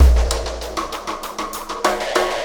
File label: Ripple Perc